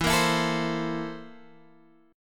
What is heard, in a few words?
C 13th E